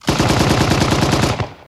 Machine Gun Singula, bursts

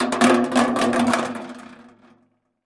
Aluminum Gutters Dro; crashes & creaks

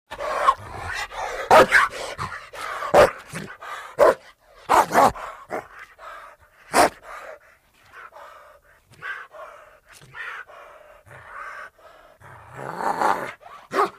Angry Dog Growls

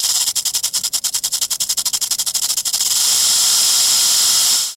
Rattlesnake, Mojave Tail Rattles.

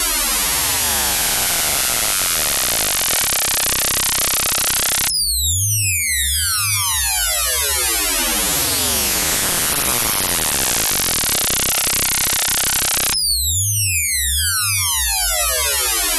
ELCTRO SYNTH TONE, drones & tones

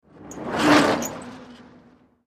Bicycle Mountain Bik

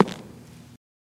FS Male On Hardwood, singles wood

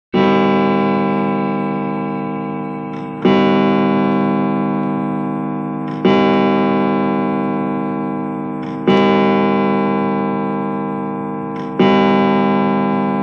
Long Case Clock Strik; clock chimes rings

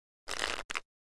Cards Shuffle Deck; casino games

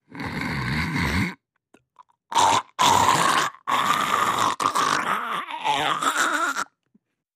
Snort, hawking; coughing & spitting

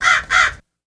Crow Caws Quickly X2

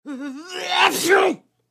Adult Violent Sneeze, male

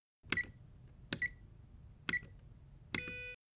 Alarm Keypad Entry; hi-tech-various

